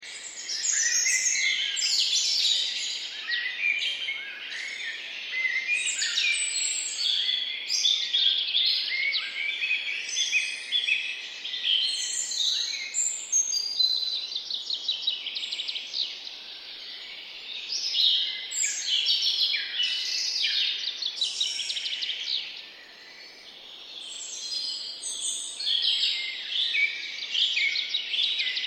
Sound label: birds chirping